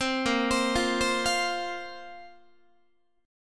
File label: A sharp Suspended 2nd, Guitar; arpeggiated; 15,18,15,15,16,16; JGuitar